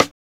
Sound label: RIM, SIDESTIKS